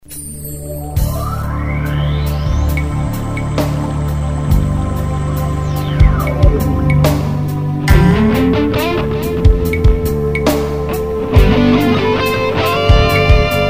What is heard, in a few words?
guitar agua